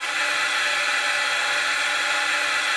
A#5, ALTOSAX; Xsample VOL-2

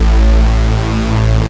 techno, BASS; Techno Trance Essentials

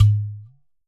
GHATAM

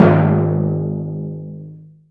TYMPANI G#2; Greytsounds VOL-1

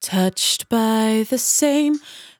100bpm Bm, Velvet Vocal; Zero-G

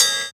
Cymbal, JUNGLE BREAKS DRUM SOUNDS

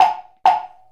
Wood Block

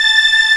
STR, Orchestral Strings

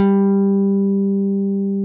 P-B PICK G4, BASS; Greytsounds; JAZZ+P BASS

